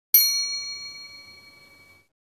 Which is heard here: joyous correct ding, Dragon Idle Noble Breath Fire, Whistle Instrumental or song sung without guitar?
joyous correct ding